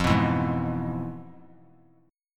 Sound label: F Minor Major 7th Flat 5th; FmM7b5 strummed; JGuitar